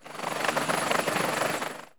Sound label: Drone